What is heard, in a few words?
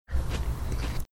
crunching away in background